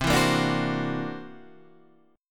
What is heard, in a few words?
C 9th Flat 5th, Guitar Drop D, chord